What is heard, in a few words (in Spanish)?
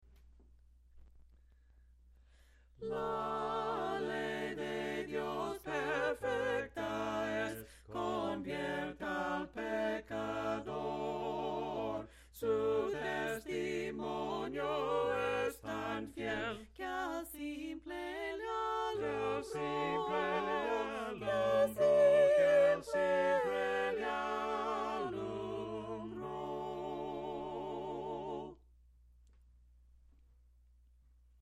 himnos a capella; cantos del camino